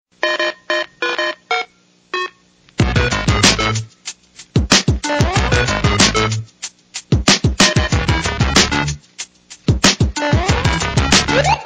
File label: mario music